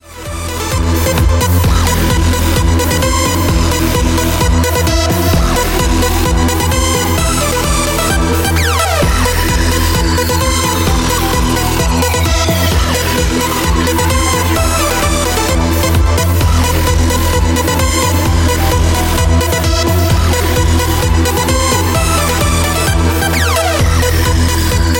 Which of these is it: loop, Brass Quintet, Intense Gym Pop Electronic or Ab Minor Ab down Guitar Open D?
Intense Gym Pop Electronic